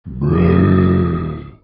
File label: trex